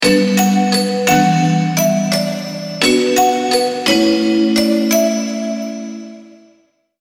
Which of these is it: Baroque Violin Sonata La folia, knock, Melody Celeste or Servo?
Melody Celeste